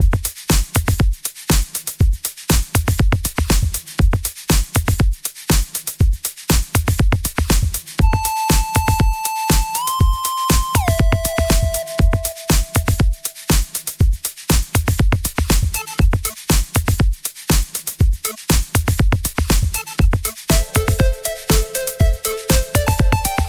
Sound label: Alien disco